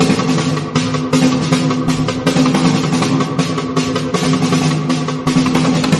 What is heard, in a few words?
snare horde; music